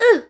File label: boy grunt; Ringling Bros. and Barnum & Bailey; Wii